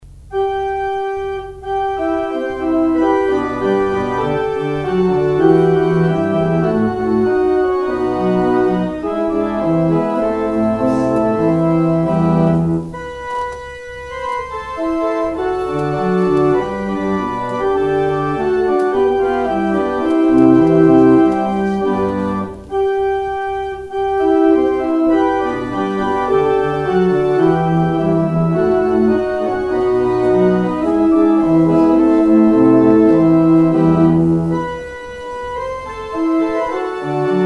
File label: organ outro